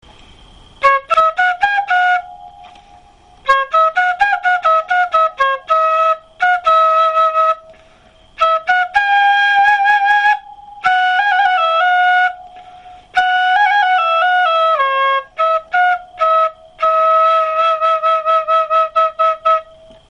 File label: takefue